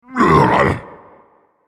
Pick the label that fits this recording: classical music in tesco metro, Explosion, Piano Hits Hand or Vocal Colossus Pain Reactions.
Vocal Colossus Pain Reactions